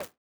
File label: step stone